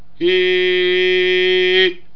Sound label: resonator ih